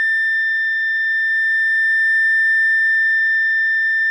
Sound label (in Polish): syrena